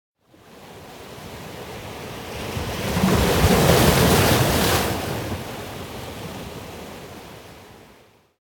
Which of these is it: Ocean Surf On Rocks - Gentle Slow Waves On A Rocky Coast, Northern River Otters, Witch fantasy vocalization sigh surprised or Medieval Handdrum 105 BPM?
Ocean Surf On Rocks - Gentle Slow Waves On A Rocky Coast